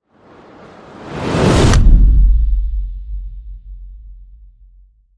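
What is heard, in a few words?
Reverse Severe Drop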